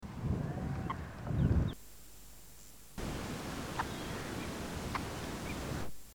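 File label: Sykes's nightjar, bird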